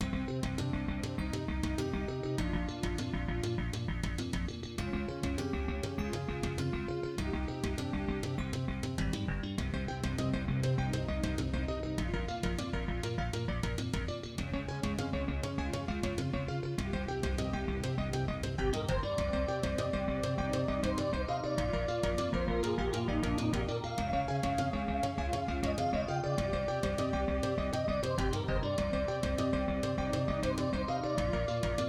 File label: yamaha sw60xg; soundcard test; Hocus Pocus